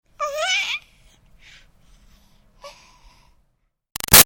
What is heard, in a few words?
Baby 6 Month Old Talk Short Smile; Babies; Voice; Big Room Sound